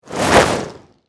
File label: Magic Spell Whoosh, Horror, Magic, Spell, Whoosh; Blastwave